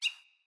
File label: Bird Single Chirp; Josalfar Bird Guide